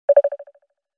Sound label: ding